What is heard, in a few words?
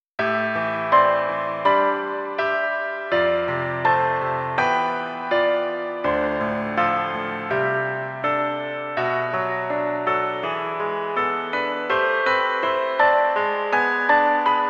piano; childfood